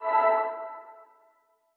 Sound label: Flash